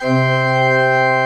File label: C3, CHURCH OR; Best Service; Advanced Media Trax Modern Composer